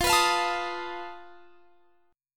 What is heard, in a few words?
F 7th Flat 5th F down; F7b5; strummed